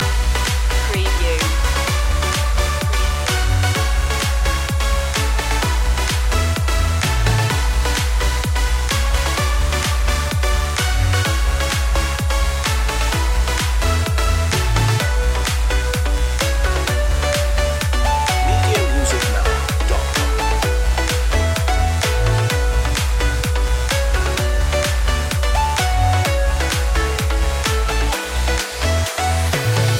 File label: fitness dance loop